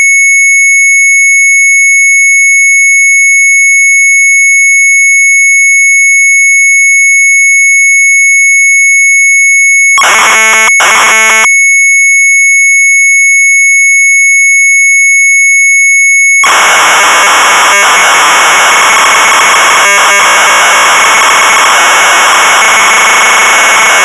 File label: Sharp MZ800 Tape; ROULETTE 800; retromelsarchive